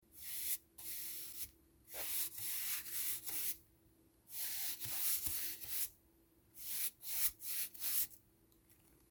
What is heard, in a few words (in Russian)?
maljarnaja srednjaja kist po doske; Звуки кисти художника или маляра